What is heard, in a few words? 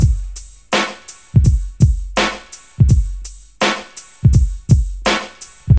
83 bpm 1990s reggae drum loop g key, Free drum groove - kick tuned to the G note